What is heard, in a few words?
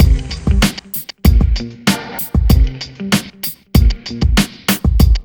BEAT; USB Soundscan vol.19